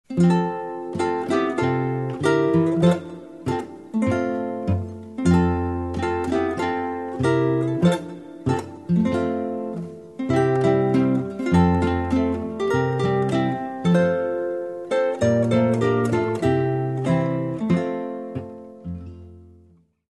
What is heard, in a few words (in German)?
boarischer